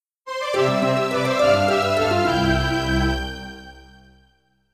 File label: Calm Message; Wii Room (Theater Update) Soundtrack